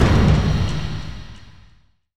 Music stings Sting

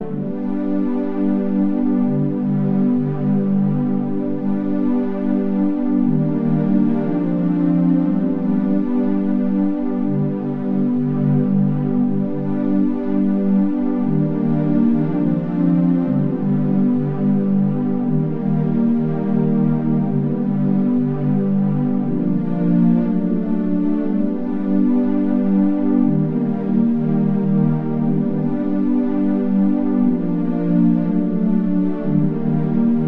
Climb to Woodburrow (Conversation)

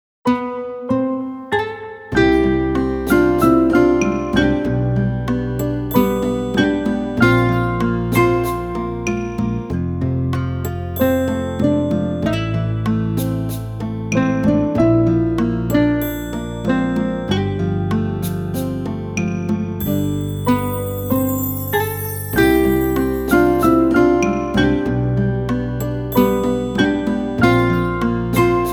Sad Version (Sad Generic)

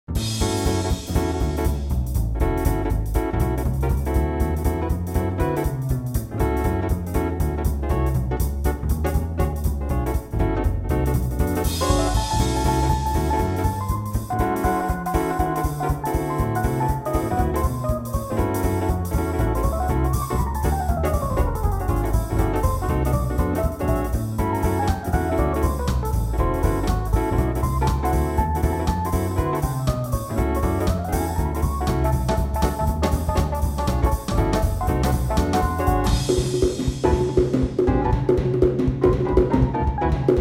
Swing Jazz; The Incredible Toon Machine

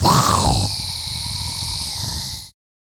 Zombie Classic

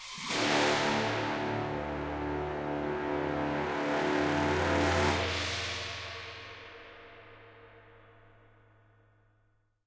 Escape Stinger; Forbidden City

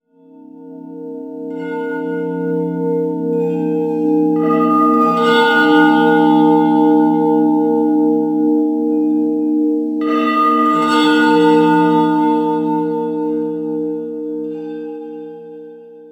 environment temple bells

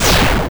Parry (Sound); gloom 2017 gamerip

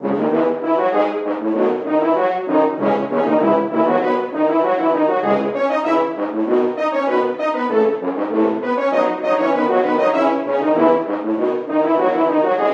Horn

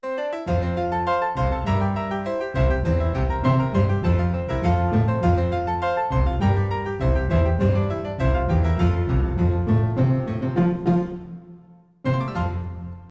Classical Music; Nancy Drew: Danger by Design

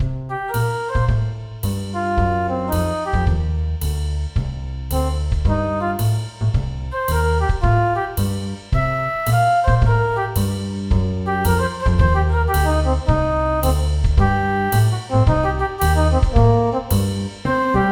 Pale City (General MIDI); Sim City 2000; ms dos

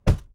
Door Car Close

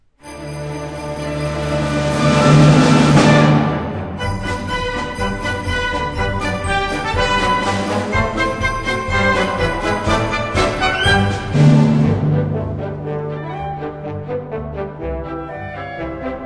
Jingle Bell; android; mobile; gamerip; cats soup cute idle game

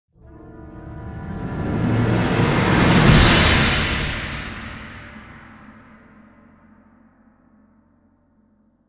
Giant Combat Outro

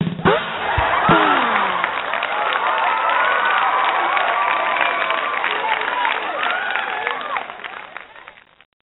Jingle Joke; Neighbours from Hell; NDS

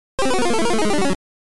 Teleport; Mickey Mouse Magic Wand; Mickey Mouse V Mahou no Stick 1993 GB

